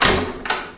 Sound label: Electro FX; Ueb HE Electro FX